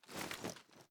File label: sm reload lift; gmod